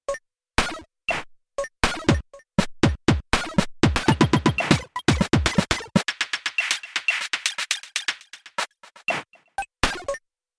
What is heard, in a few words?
Chip; KOPRO+